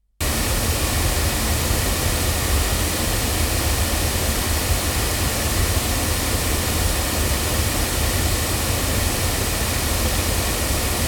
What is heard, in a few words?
Hamburg loudspeakers; ADK